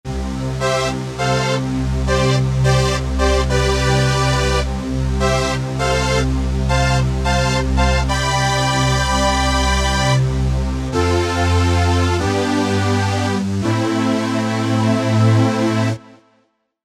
Power Pad

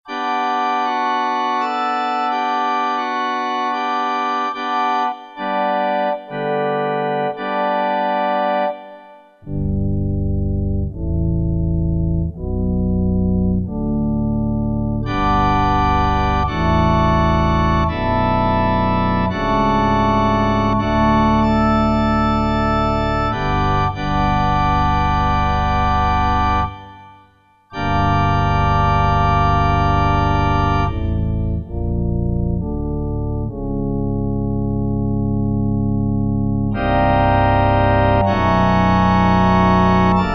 Pedal Pipes; Kurzweil K2000 Orchestral ROM

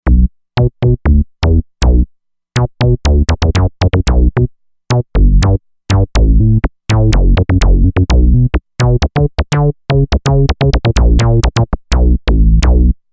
Strobe Bass

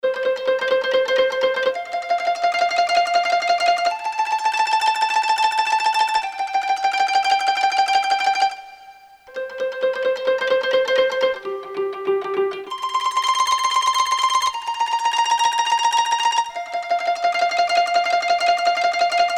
KOTO TREM, Korg DSS-1

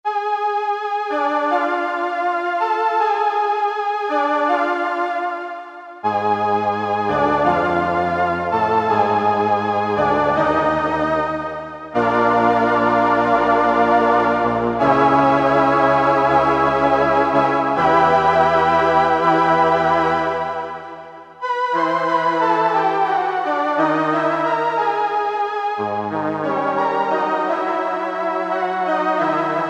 RHAPSODY STR, Akai SG01v

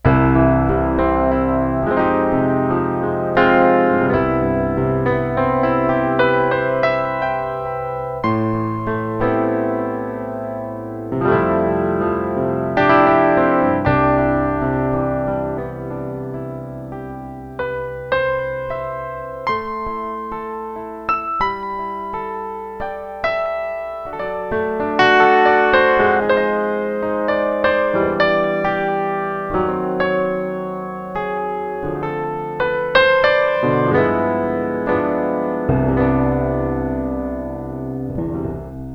Piano

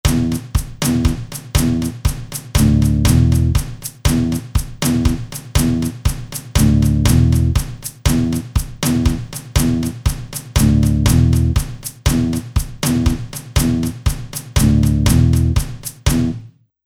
Rock

